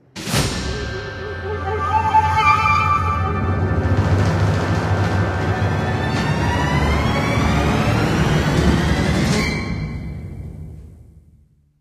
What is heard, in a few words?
sting; The LEGO Ninjago Movie Video Game; PS4; Windows; Xbox One